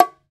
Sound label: E BONGO R, World Percussion